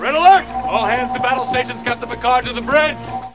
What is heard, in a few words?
red alert; Jonathan Pictures